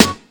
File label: crunchy snare single hit D key; snare drum samples